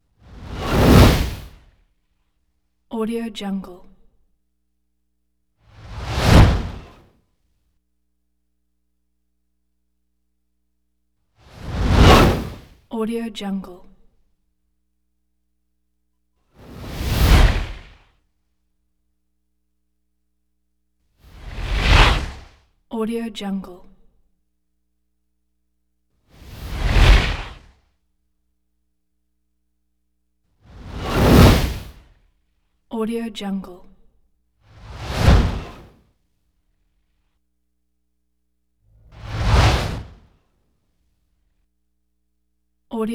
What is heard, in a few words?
whoosh by fiery